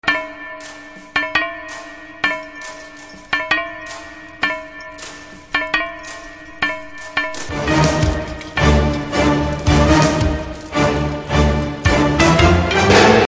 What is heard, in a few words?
Flashback BGM; Kaithi